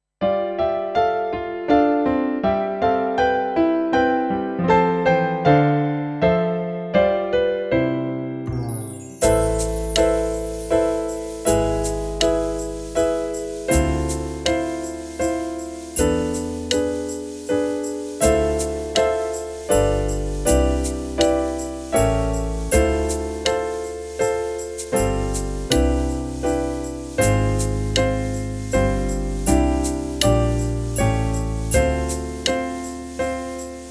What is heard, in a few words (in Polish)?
akompaniament